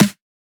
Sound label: Snare, Dance Drum Kit; Drum Oneshots; Twilight; Monosounds